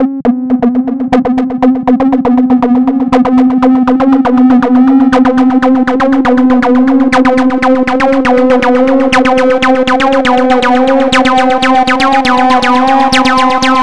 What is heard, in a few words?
Lead